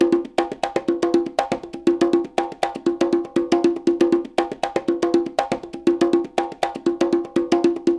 Percussion Hook

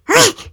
Lurker Startle

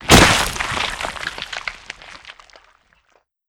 Gore Explode

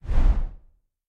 whoosh; Folk Hero

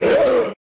Attack

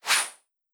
Saber

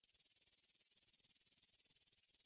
Hatchling Fly Loop; Hatchling Fly Loop 2

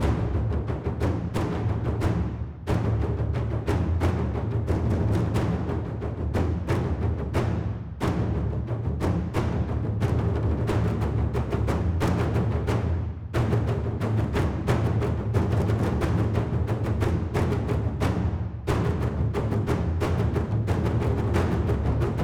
Colosseum Intensity

